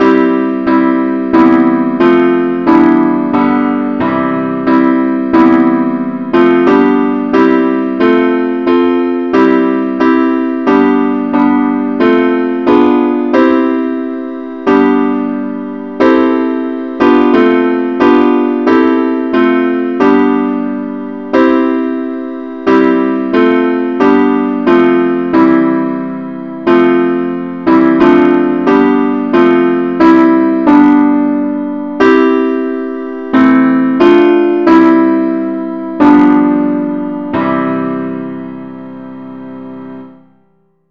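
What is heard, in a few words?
advance accompaniment, key c; christe Sanctorum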